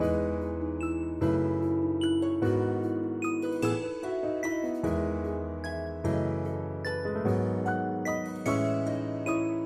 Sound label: ambience Free Spin; magic maid cafe